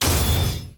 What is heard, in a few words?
machine hard hit